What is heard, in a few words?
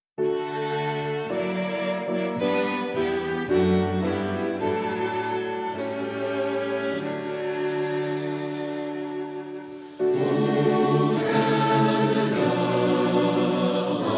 o canada national anthem